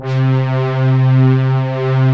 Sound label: BRASS PADS; Pro-Rec - Synthsonic